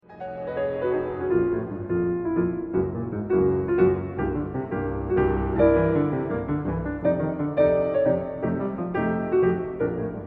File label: Tempo di Charleston; Gieseking